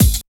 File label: KICK+HAT; East West - Phat & Phunky CD2; 90's Sample CDs